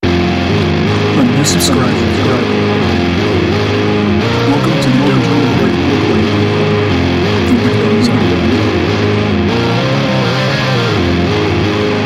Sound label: epic vintage lead medium open; landr; dojo of wind